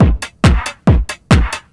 drum 138, Techno; drum-loops

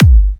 G, Kicks; markus hakala

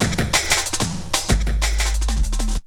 Essential beats

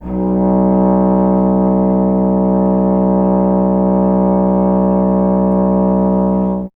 tuba; Miroslav Vitous - Solo Instruments VOL-2

